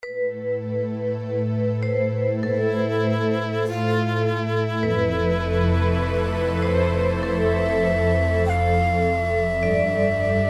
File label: dark